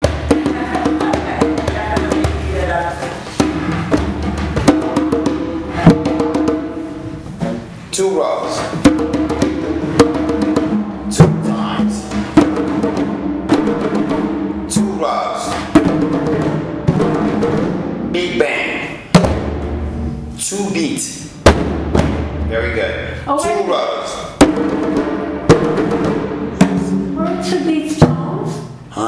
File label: 2 rows, big bang, 2 beats, drumming group